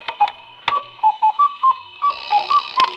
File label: antique cuckoo clock single chime and call